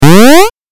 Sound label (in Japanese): 8bit ジャンプ